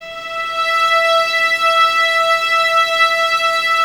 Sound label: VNS E 5, Violins, String Ensembles; Miroslav Vitous